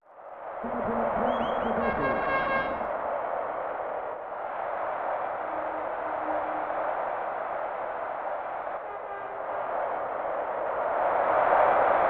In-Game Ambience; Ken Griffey Jr. Winning Run; SNES

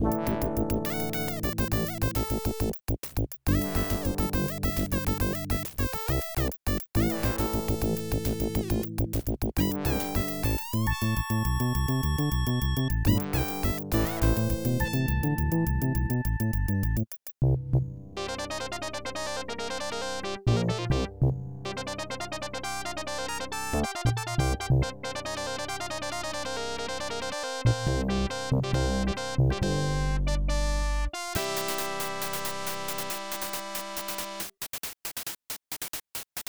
Boss fight; The Berenstain Bears Camping Adventure; Genesis

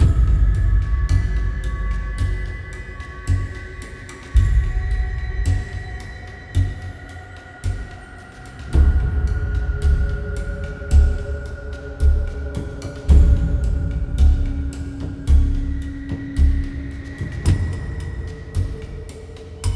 music caution; music 1-1 area 5

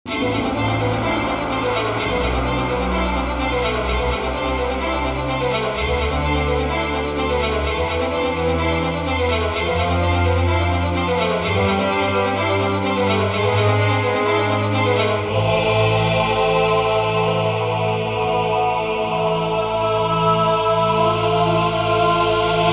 Boss Music Intro; Gloom

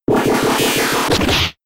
Snarl

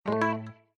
Characters Hardware Insert; windows 7

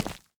Footstep Asphalt; this is the zodiac speaking 2020 gamerip switch ps4 xbox one pc macos

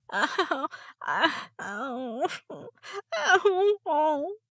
moan

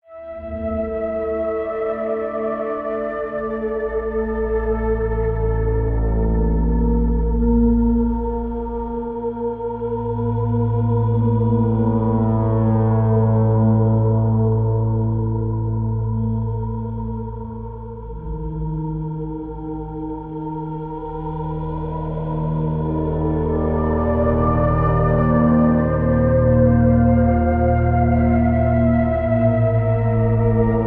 Underwater Swimming; assassins creed origins unofficial soundtrack ps4 windows xbox one gamerip 2017

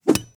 Voices Axe Hit; rebel cops 2019

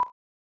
Putt Cursor

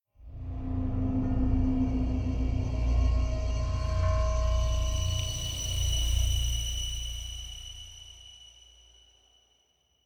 Pentagram Deactivate; The Town

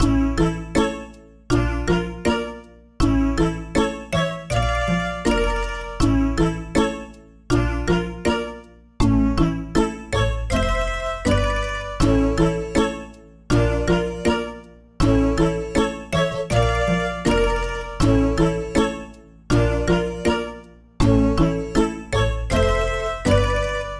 Lobby; Cookie Run OvenBreak; Ui Lobby Ovenbreak Select

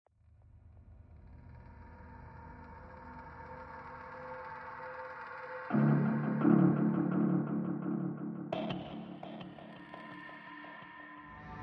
Sacrifice (Ambient); modern combat 3 fallen nation

